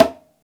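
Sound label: perc conga, deep congas; Roland LCDP14 Africa VOL-2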